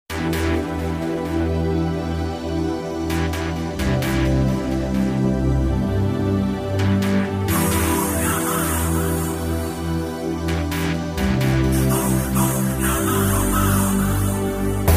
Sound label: techno